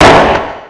backfire ex, kart

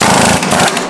wheelguns; AOS RF1 Kart Demo

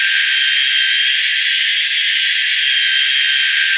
TRANS ON HIGH 8000 RPM; AOS F1 2022

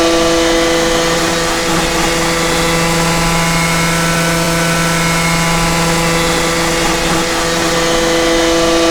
KART EX OFFHIGH

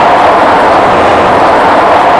scrub, tires; AOS RF1 Kart Demo

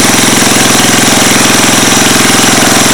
mower off high in

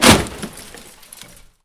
impact wall light; Kart; AOS RF1 Kart Demo

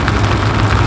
gravel, Tires; Kart; AOS RF1 Kart Demo

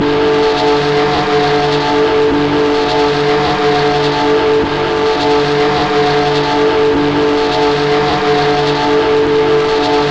ex PLOW; Italy; AOS F1 2022

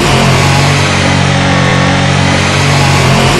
in PMED, F1; France; AOS F1 2022

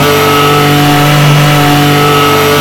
in PMED, F1; Mercedes; AOS F1 2022

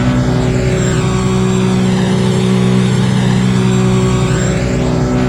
IN OFF MID, F1; AOS F1 2022; FSR2018

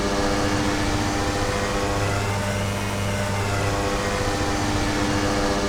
KART IN OFF LOW

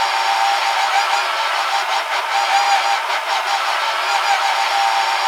TRANS ON LOW 4000 RPM; AOS F1 2022

